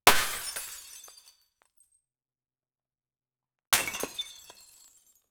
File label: impact of rubber ball